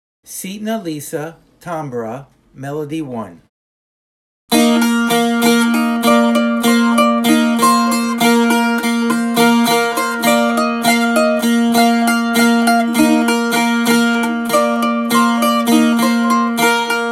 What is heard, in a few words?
tambura